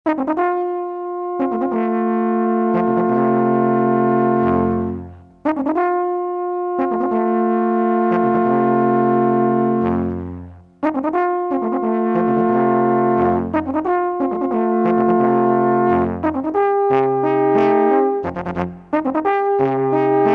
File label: fanfare alphorn